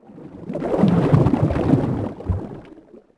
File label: Mask Fall; Finding Nemo; XBOX